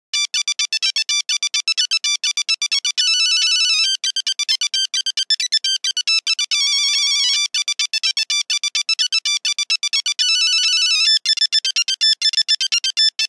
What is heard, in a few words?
Nokia Buzzer Toreador; Windows Phone apps